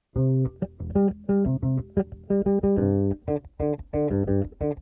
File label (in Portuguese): Baixo Vaneira Loops